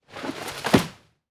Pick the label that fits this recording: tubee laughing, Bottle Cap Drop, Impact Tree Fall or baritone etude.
Impact Tree Fall